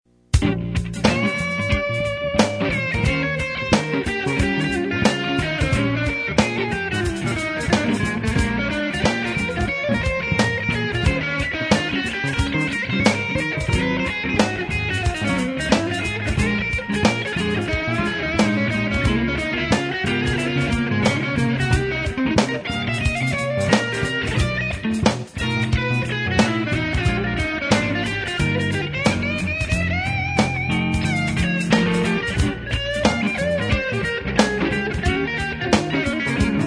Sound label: Blues funk jazz